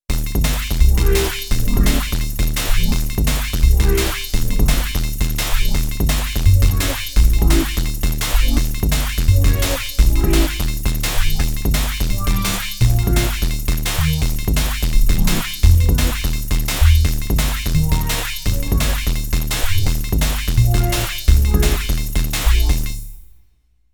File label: Bass, Korg Radias-R; Factory Presets; Jupt Bass